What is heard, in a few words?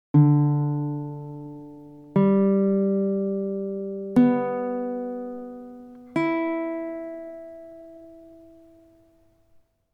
tuning check uke bari; Hear all strings (baritone)